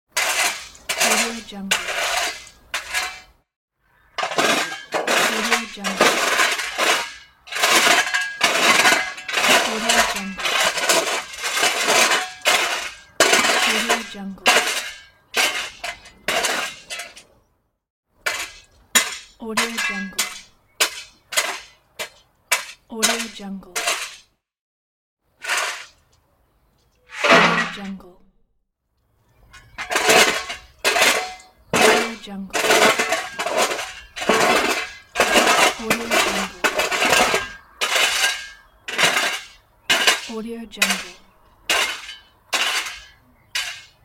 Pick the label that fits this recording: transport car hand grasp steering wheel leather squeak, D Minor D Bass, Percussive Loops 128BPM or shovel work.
shovel work